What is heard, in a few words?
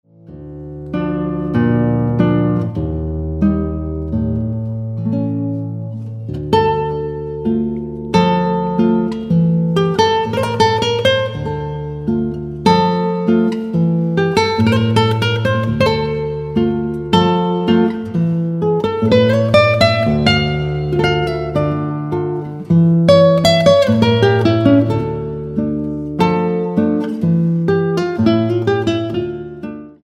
Caprichio Arabe